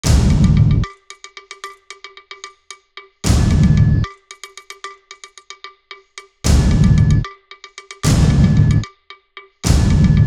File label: Disturbing Power, Drums